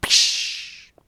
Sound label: Crash